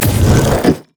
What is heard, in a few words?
sight ward stone; LoL; items